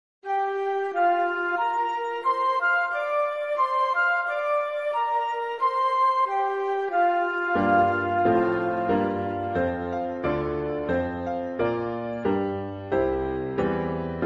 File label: Promenade Flute Quartet w Piano; Pictures At An Exhibition